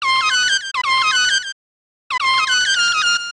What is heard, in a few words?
Unknown Fanfare